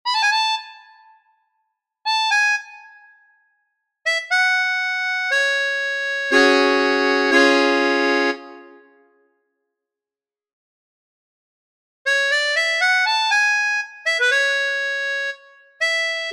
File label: tango, melodica